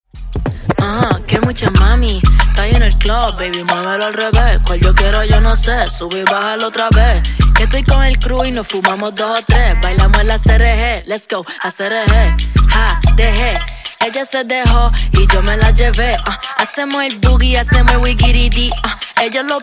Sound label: Funk Intro Outro 93Bpm